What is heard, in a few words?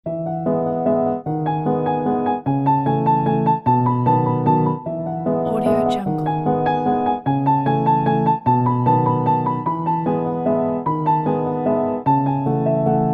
summer piano waltz